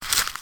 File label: paper dig; minetest; minetest mtg plus